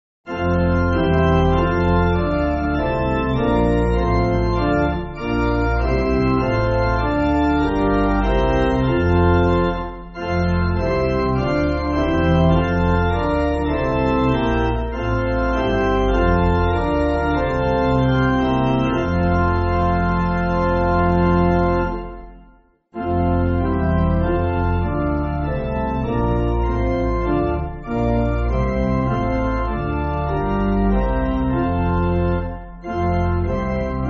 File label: Pipe Organ, Backing Files for Churches; Well They Fight; Herrnhag